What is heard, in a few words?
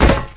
warehouse land; Original TK Sounds